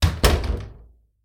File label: door close; Golden Crayon Sounds